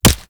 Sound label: Player Bullet Impact Flesh; Warframe